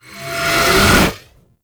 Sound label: Cast Riser; Uriel Brimstone; Warframe